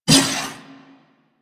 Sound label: Shotgun First Shot; Weapons; Warframe; Tenno; Alchemist